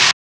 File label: SCREETCH, Drums; Distored Reality 1&2